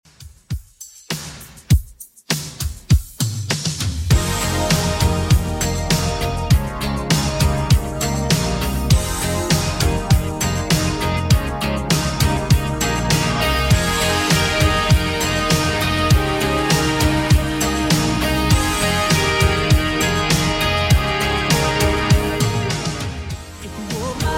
80s Redrum, 100 bpm

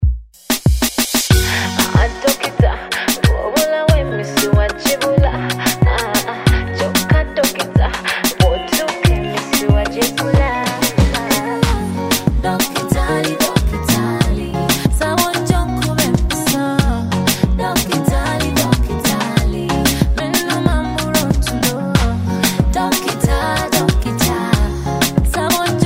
Intro Drums 93 bpm

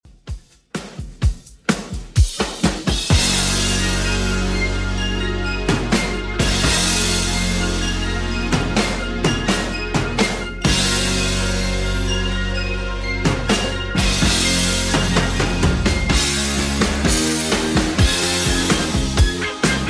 80s Redrum 127bpm

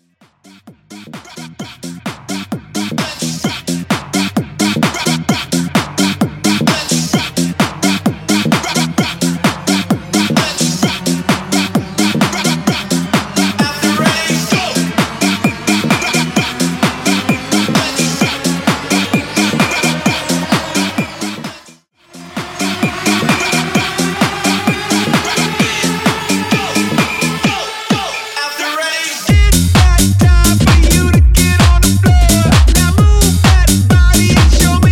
Techno 130BPM

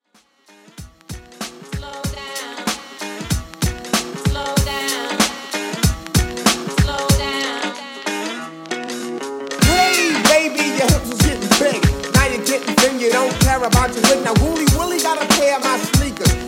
90s 95 Bpm; Redrum

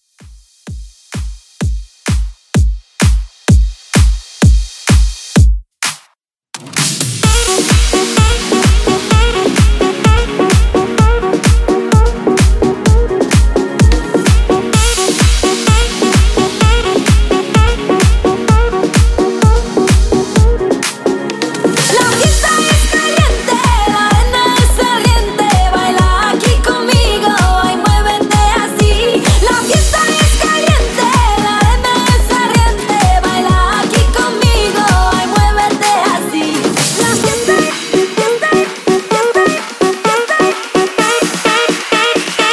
Dance 128 Bpm; ReDrum

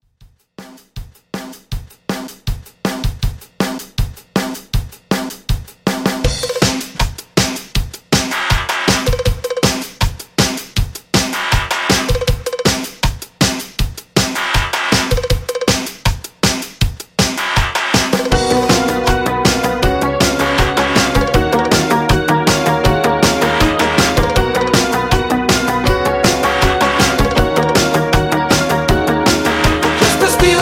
80s Pop Rock Re Drum 159 Bpm

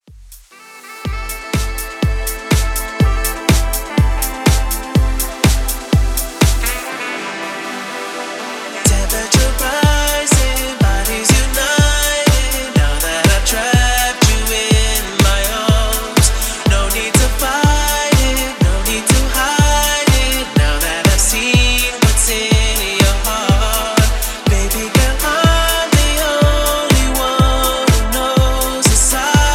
Top40 ReDrum Intro Out 123 BPM

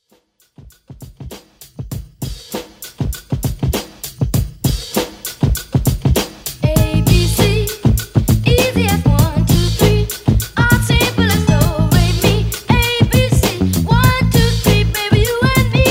Old School Hype Mash Up

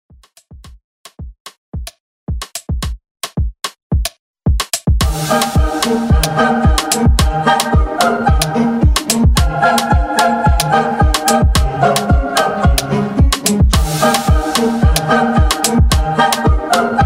XMas Moombah

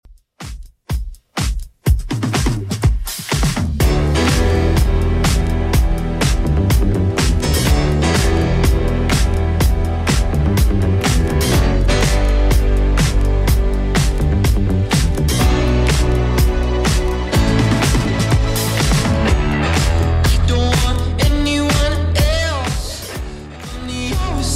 124bpm; Top40 Redrum

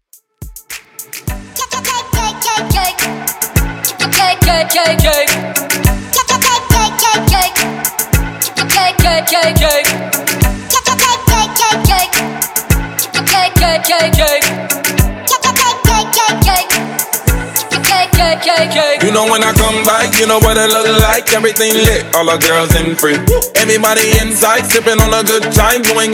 Throwback Redrum Extended 105 BPM

